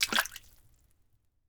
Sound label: Liquid Water Water Footstep Single Step In Puddle